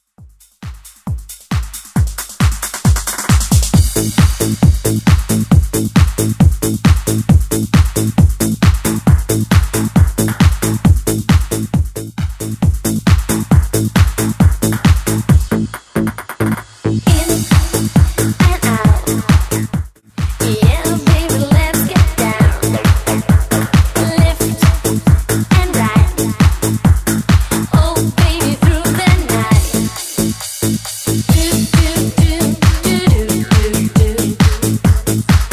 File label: Quick Hitter; Redrum